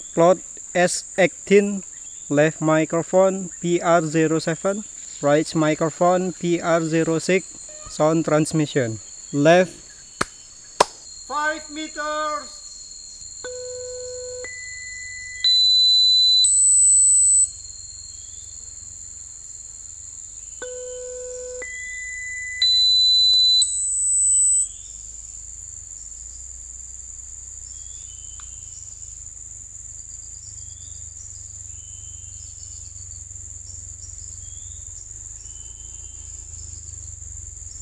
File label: birds